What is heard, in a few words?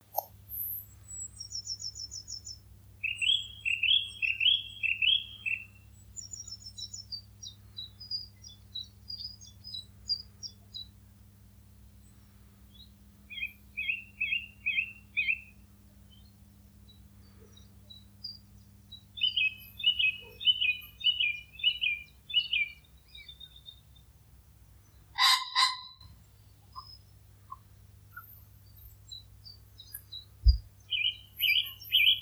morning birds; animals